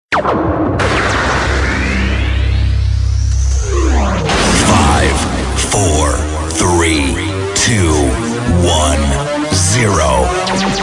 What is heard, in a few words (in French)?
Jingle radio web radio